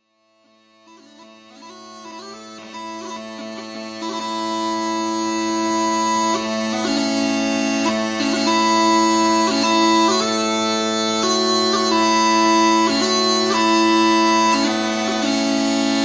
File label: Pipe Band; Shuttlepipes